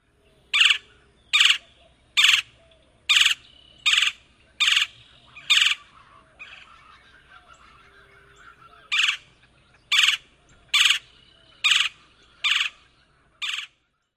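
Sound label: churr calls, bird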